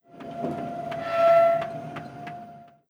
pumpjack pump up; Rust

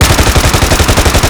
Automatic AK-74, Weapons; DCS

